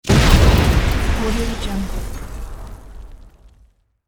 huge explosion

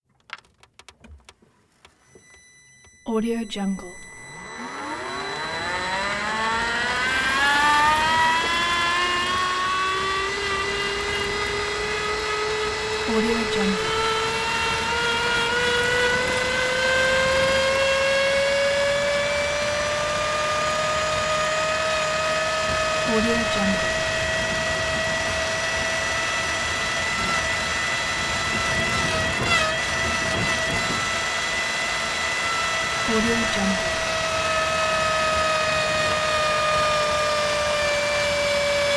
lafferty electric car sustained beep reverse; prosoundfx

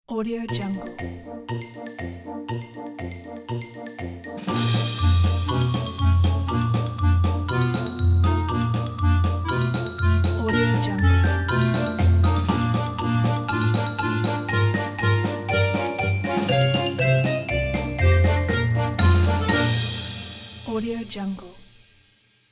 clowns

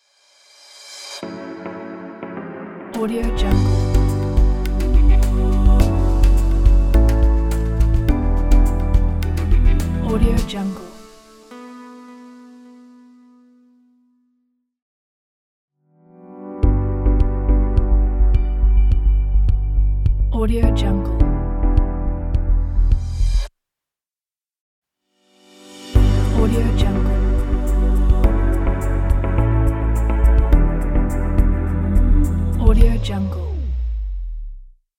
lounge intro ident

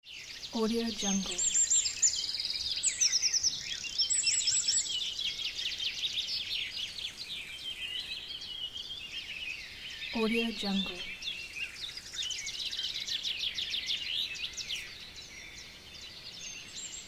birds

